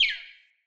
Ext Close Bird; Midrath Island

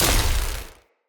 Cannon Tesla Shot; Weapons; Player

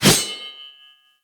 Sword Swipe, Whooshes; Weapons; Melee

